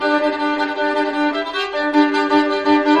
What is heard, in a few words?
violin; fiddle